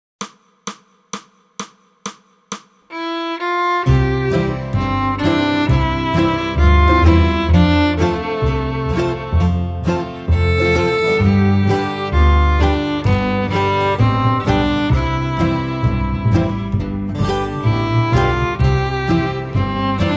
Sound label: Great Speckled Bird Fiddle 130